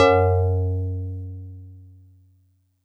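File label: StarPiano DX100 F#2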